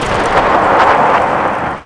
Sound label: SKID; mtm2demo